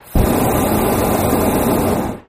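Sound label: Hot Air Bllon Burn Hi, Hot Air Balloon Burner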